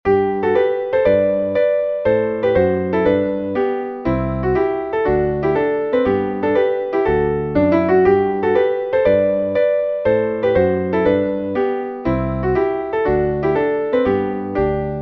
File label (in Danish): schottisch